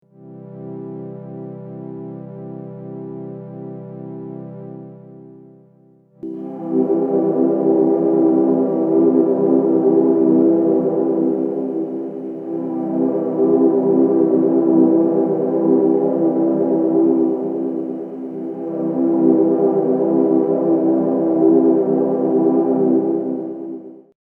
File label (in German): Dreadbox Hypnosis Modulation Fläche